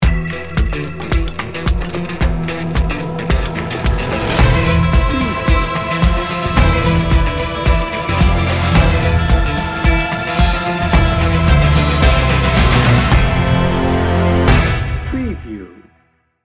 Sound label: epic and determined